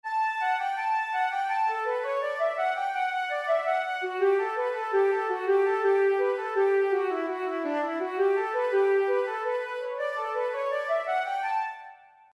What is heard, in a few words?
kanon melody